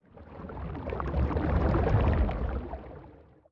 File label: creature swim fast; glowwhale